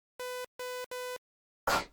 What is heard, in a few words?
plant; Chelimbalo